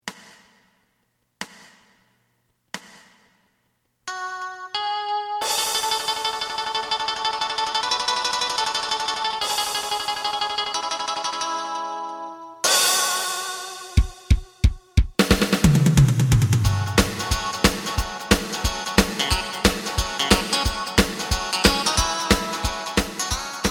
bass

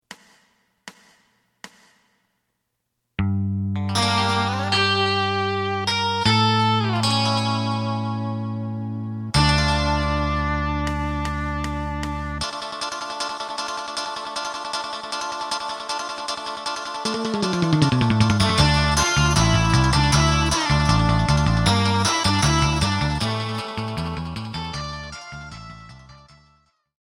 drum; エレキバンド用カラオケCD

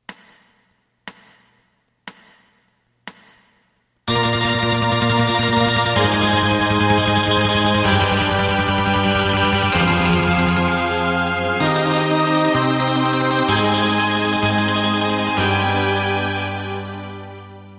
drum; 四季／春

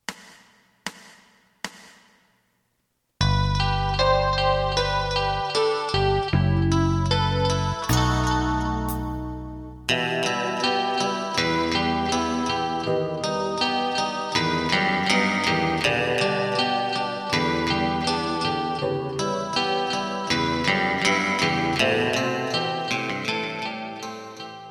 drum; エレキバンド用カラオケ

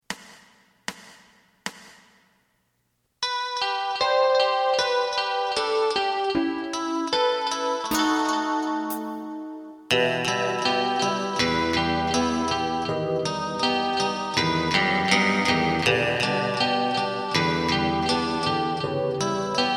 bass; エレキバンド用カラオケ

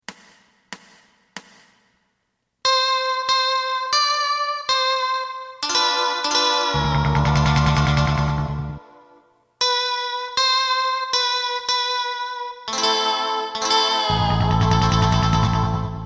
drum

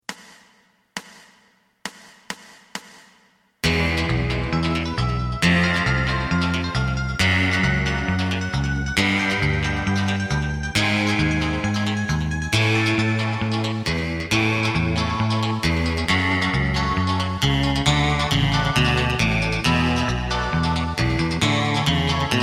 drum

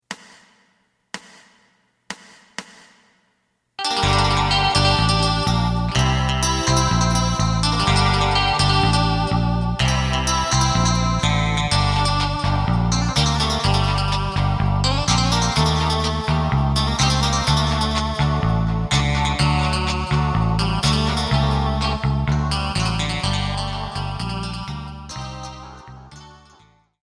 drum

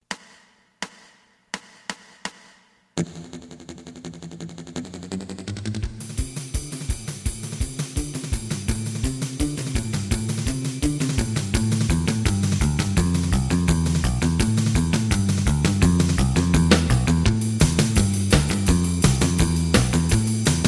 lead; エレキバンド用カラオケCD

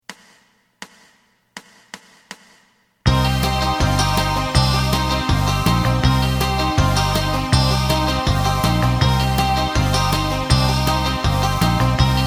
lead

